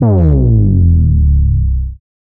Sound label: Bass Drop; SoundsCrate